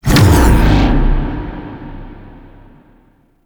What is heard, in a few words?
Medic Bubble Expand; Warframe; DE; Vehicles; RailJack; RailJackEnemyShips; Grineer; Space Fighter Medic Agent